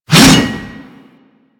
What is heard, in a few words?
Axe Swing; Warframe; Weapons